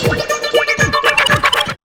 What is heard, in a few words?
SYN RIF